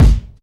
original kick sound g sharp key, kick drum sound tuned to the G# note